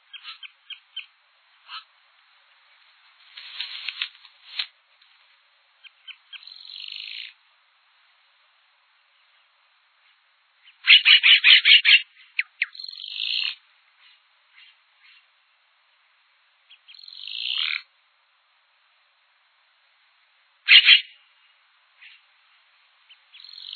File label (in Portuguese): guaxe